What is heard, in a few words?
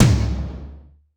Korg 01W MegaDrums; 300 Drum Machines